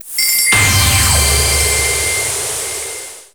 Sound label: SE RESULT S; maimai